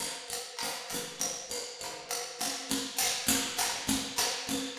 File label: Kabuki Metal; Ambient Cinematic; Rhythmic Atmospheres